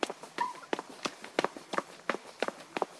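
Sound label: sneakers on asphalt, children running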